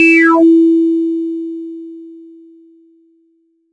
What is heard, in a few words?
ppg sustained acid e4, PPG multisampled acid synth; Jovica